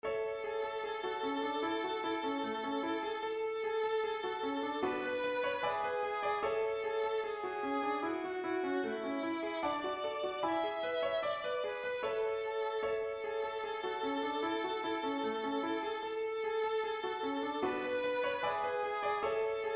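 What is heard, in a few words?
melody chords harmony; Trip to Benton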